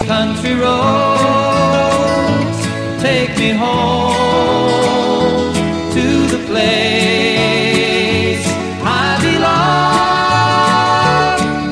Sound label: country